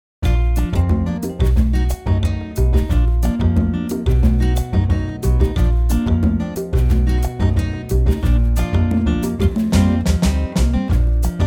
acoustic Bb

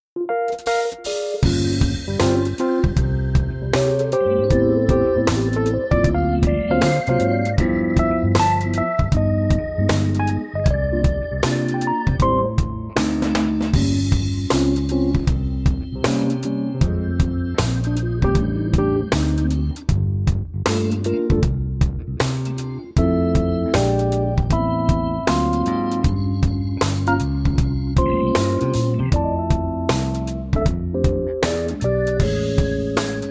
smooth

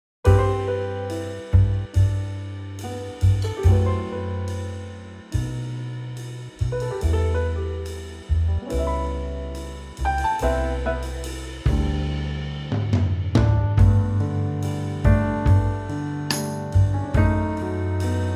G trio; key - G - vocal range - D to B

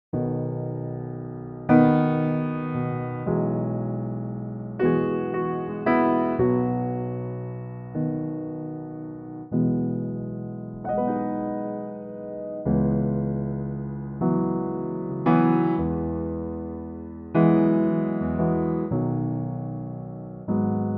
B piano; key - B; more open and chordal based, with a slight swing

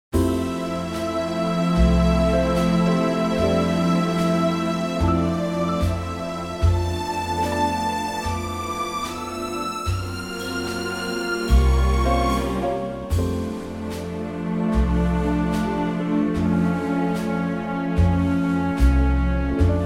F orchestral; key - F - vocal range - D to E

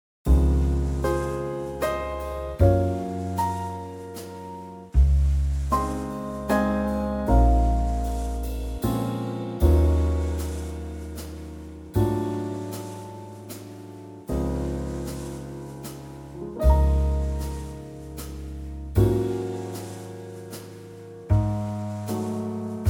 Db trio

